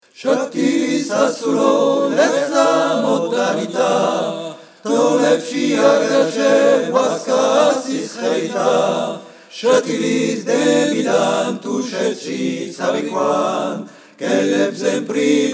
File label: Tutti; shatilis asulo